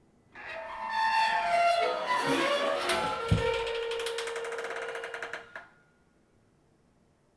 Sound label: metal creak; Creaking metal; grunk; ambient